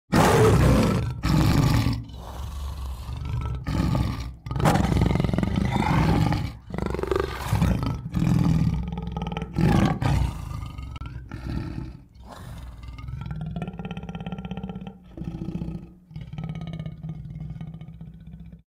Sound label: tiger attack